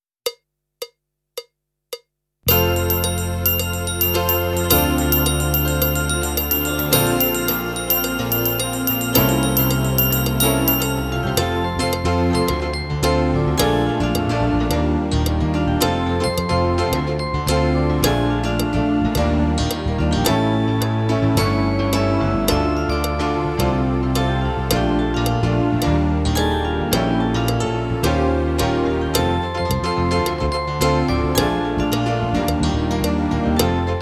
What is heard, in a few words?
Kalimbo Backing Track